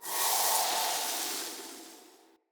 Wolf Howl Buffed Entity Attach Loop End; Orengall

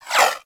Laser Whizzby; Warframe; Bullet Impacts; Whizzbys

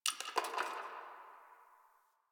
Ice Crack; Warframe; Nineteen Ninety Nine

